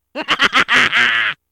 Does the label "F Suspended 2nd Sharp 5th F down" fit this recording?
No